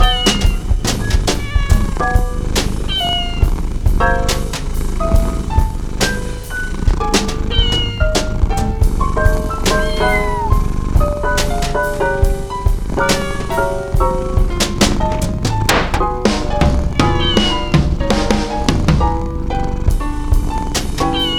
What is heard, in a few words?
meow noise; Chaos; chopinst